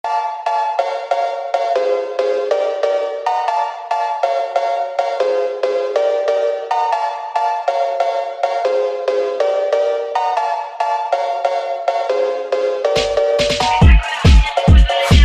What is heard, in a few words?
kitty phonk Sped Up